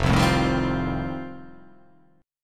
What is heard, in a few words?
F 9th F, chord